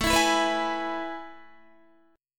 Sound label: A Major 7th Suspended 2nd, Guitar; AM7sus2 strummed; 16,19,16,16,16,16; JGuitar